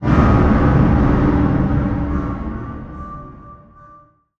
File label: impact; Bluezone; Scary Ambiences and Sound Effects